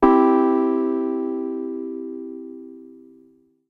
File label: e piano accord; instruments; lmms